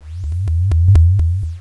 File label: sweep; Station-0; warden